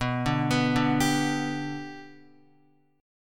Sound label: B-Minor Sharp 5th, Guitar; arpeggiated; JGuitar